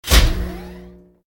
laser beam on; toontown-ceiling

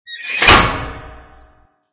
cage door; CHQ SOS; toontown-ceiling

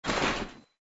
create toon store; Toontown Online

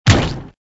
cannon hit tower; Toontown Online

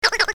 clock

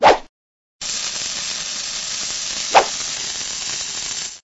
dynamite; toontown-ceiling

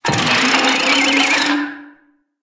spin

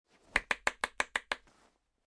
emotion applause

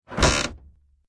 crate effort; CHQ FACT; toontown-ceiling

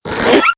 toon decompress

